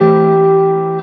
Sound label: Guitar, Dirty Chord